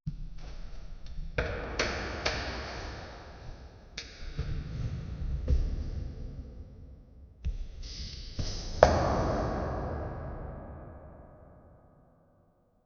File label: slow high heels approachi; reverb